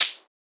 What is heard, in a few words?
Closed Hat; FLPRO